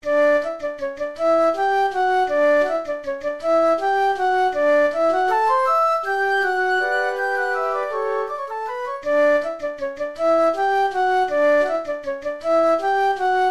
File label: fandango spanish dance; op 5 no 3